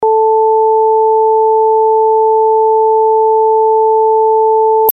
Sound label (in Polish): 440 Hz i 1 harmoniczna